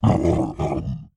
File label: kagouti injury; skywind